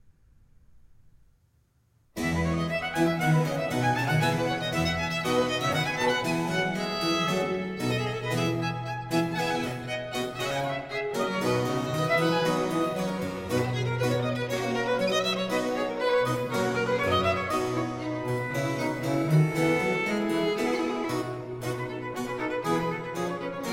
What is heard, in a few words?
Sinfonia, alto two violins and continuo; Caldara; Cantata Medea in Corinto